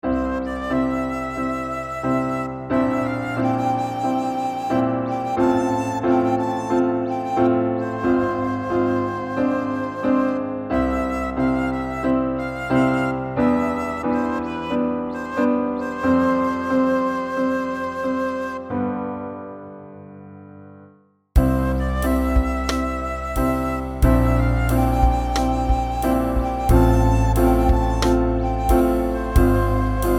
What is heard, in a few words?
Pop